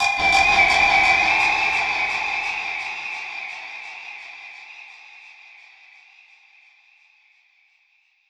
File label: Perc Hit, dub percussion; musicradar